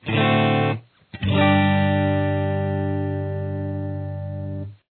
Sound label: d dominant 7th resolution, guitar